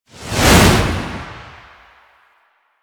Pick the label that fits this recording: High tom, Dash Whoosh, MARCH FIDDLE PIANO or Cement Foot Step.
Dash Whoosh